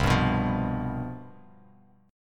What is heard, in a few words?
C Major 7th Suspended 4th, down; strummed